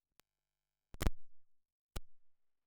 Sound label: Bass; Snsamp